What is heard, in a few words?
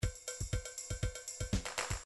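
midi drums samba disco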